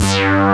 WIGGLY BS; Pro-Rec - Synthsonic; TRINITY

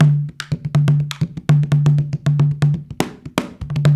160, African Ethnic Drums; Spectrasonics; Supreme Beats

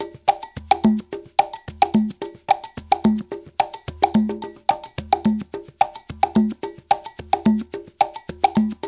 Soukous; African Contemporary; Spectrasonics; Supreme Beats

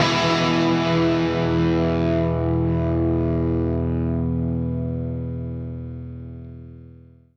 Guitar Outro, Indie Rock; Sonic Foundry (Sony Creative Software); Indie Rock Anthems; Session 10 - Roswell

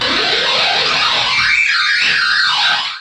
Kaos FX, Ambient Grooves

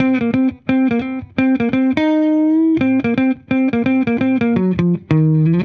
70s Strat, Guitar, 85bpm, C, dusty funk; musicradar

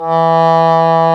WND English Horn; Roland L-CDX-03 Disk 1

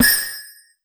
TAMBO; Masterbits - Total Zone; ADD.PERC.

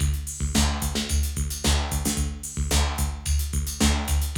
ZIPPER 120; AMG; Global Trance Mission VOL-2; PROCESEDLPS

